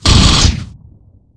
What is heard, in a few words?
SMART FIRE, weapons; svencoop; aliens